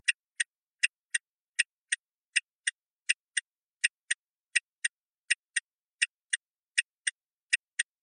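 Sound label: car turn signal sounds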